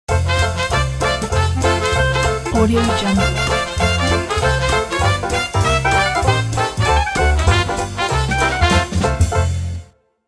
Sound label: hot jazz logo